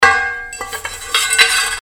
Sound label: Sword Sound; FXHome; Fighting Sounds